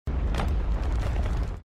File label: moving mountain